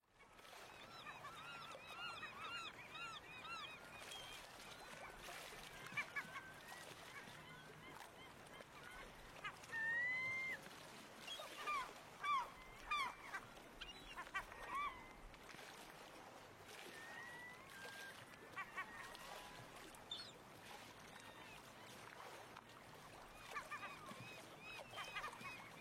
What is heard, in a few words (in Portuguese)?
Canto do ostraceiro africano